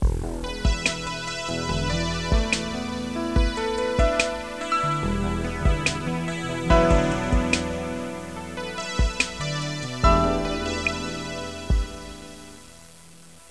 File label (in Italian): musica newage